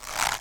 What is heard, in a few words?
bow shoot pull short; tf2